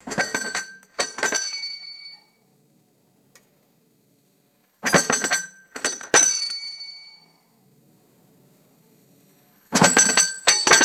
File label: metal stick dropping and bouncing on bell; bells